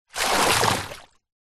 nature water pour from plastic bucket into deep water; zapsplat